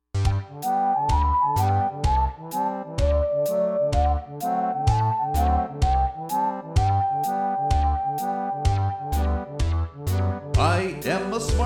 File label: smarty pants w vocal